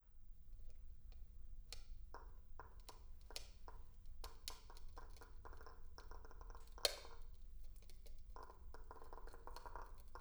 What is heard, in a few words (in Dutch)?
Knarsen van wortels